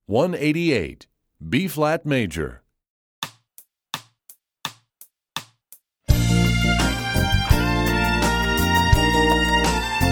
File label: B flat Major, Strings; EE2000 Book2